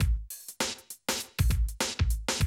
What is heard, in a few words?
drum loop, 100 BPM; Best Service; XXL 1500 DrumLoops